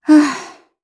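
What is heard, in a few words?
Vox Sigh, Japanese; Valance; kingsraid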